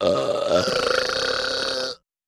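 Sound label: Medium Nasty Belch; belching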